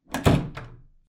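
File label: door slam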